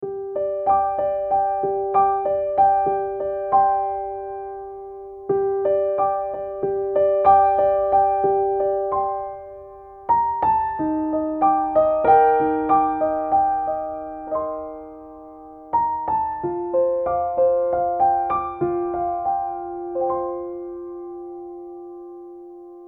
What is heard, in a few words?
Piano Vers.; Impostor Factory Original Soundtrack 2021